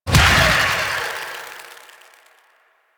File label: Fairy Dust Explosion; DE; Warframe